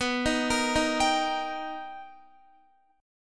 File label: B Augmented Major 7th B Guitar, chord; B+M7; arpeggiated; x,14,15,15,15,15; JGuitar